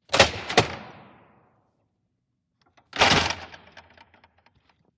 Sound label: Ush Bar School Door, push bar